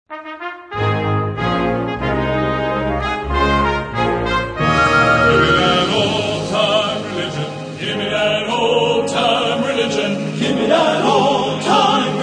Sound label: Men's Choir